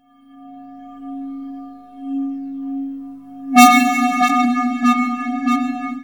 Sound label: space echo; Ragga & Dub Reggae; AKAI; USB Soundscan vol.27